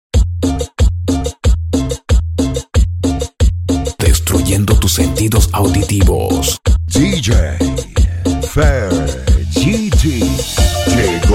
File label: MARIMBA MIX